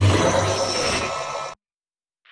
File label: sci-fi door movement